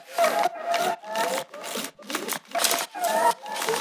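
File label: 126, AMBIENT TEXTURE LOOP, Ab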